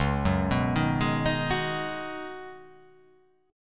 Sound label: C sharp 7th Suspended 2nd Suspended 4th C sharp down